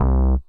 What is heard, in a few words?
BASS